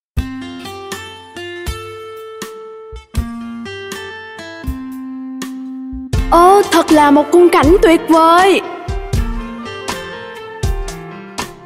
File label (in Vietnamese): bài hát cho trẻ em; hey diddle diddle; van dieu tre; tieng anh cho be